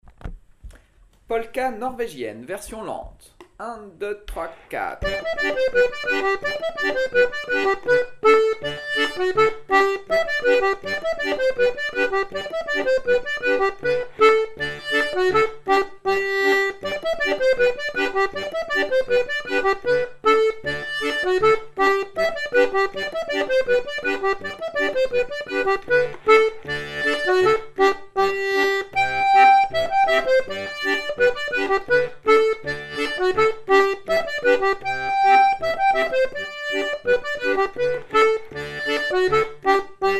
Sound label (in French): polka norvegienne